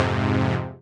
Fm Dub Chord; Electronisounds; Enigma Dub Cube One Shots

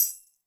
shaker, Zoom MRT-3B

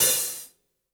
Gretsch Hi-Hat Open; 1967 Gretsch Kit; One-Shots; Sonic Foundry (Sony Creative Software); Vital Drums

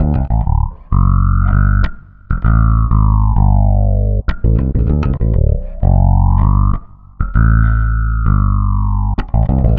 Bit Reduced Bass, Electric Bass; Sonic Foundry (Sony Creative Software); Leo Cavallo Bass Taster

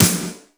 BG SNR, METALIC DRM; Crypton - DrumBase5000; METALIC DRM2

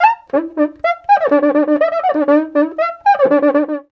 RIO, Rio Batucada

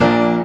Piano Pounce; Mo' Phatt; Digital Sound Factory Mo' Phatt

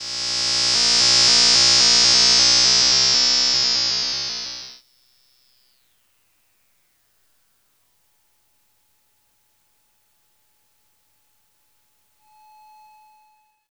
electric, special FX twisted; USB Soundscan; AKAI

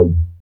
BAS, Eurotech; Sounds Good

